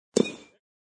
Baseball Hit Aluminum, Sound of baseball hit with aluminum bat; Sports